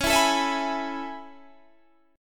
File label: A Major 9th C sharp, chord